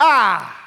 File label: "ah"